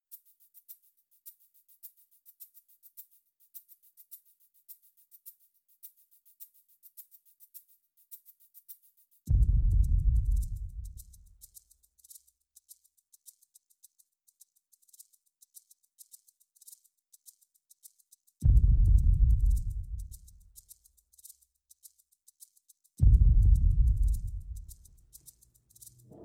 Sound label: perc only